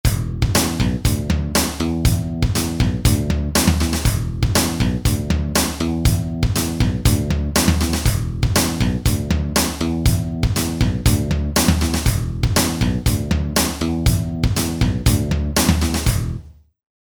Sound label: Rock